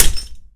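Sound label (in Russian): глухой удар прикладом автомата по камню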